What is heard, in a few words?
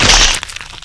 Bite, Zombie; Paranoia; Zombie C